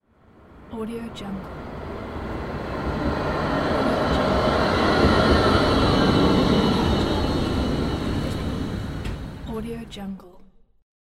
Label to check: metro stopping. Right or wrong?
Right